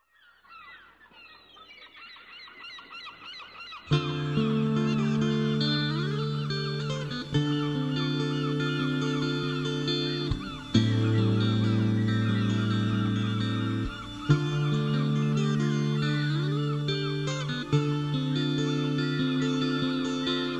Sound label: Instrumental